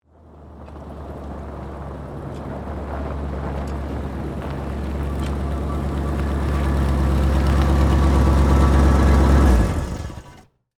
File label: Jeep Approach Off, Automobile; Transportation; Jeep, Cherokee, Laredo, 1998, Exterior, Hatch, Back, Door, Close; BlastWave